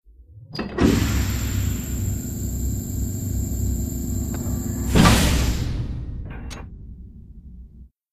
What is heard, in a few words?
Airlock Close, pneumatic